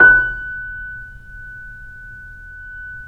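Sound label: keys, YC7 piano pp; Rhythm Section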